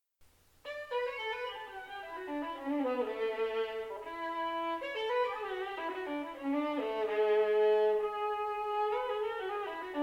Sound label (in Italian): gli armonici; 15 studi capricci